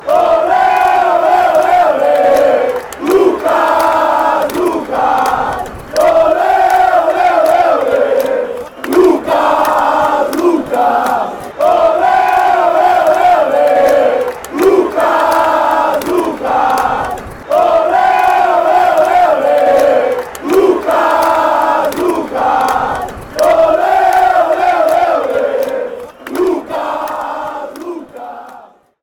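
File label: sao paulo chant, football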